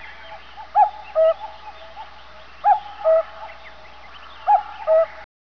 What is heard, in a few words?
cuckoo